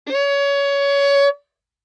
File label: Violin arco ff sul G Db5